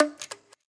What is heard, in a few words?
Flute slap